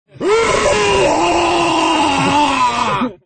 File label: Scream